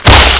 bull wood, weapons

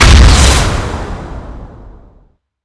beamgun jump end, weapons

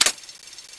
shoot empty; thanatos1

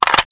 an94 clip in, weapons

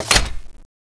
cross foley, weapons

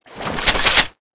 beamgun draw

